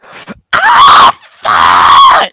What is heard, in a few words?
cow pain; svencoop; cow tycoon; gonome replace